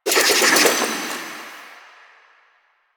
Kunai Reload; Warframe; Weapons; Choir

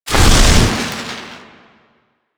Flamethrower Stop; Warframe; Weapons; Lunar NY Ignis Skin

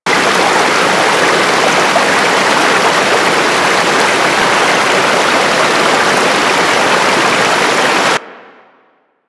Forest River White Flow Fast; Ambience; Props; Warframe; Grineer Forest

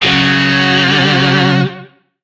Shawzin Chromatic Chord; Warframe; Lizzie Shawzin

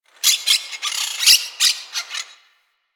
forest creature squawky high; Ambience; Warframe; Grineer Forest

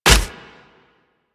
Nunchuck Catch, Weapons; Warframe; Infested

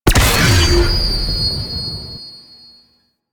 Explosion; Warframe; DE; Sentients; Repeater

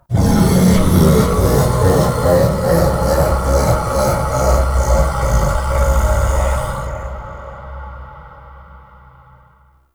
Tablet Wrong Answer; Warframe; Duviri; Easter Eggs

